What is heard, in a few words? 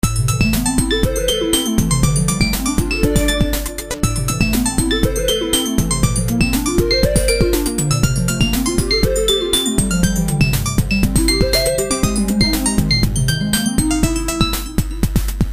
Techno, ringtones; Mana